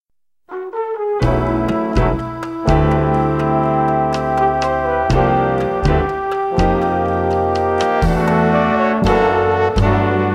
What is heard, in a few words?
jazz orchestra